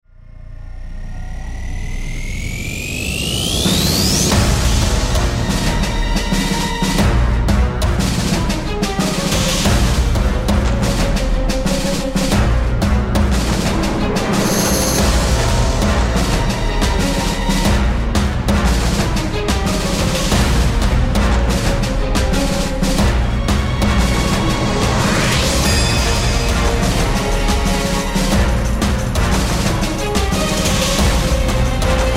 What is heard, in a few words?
music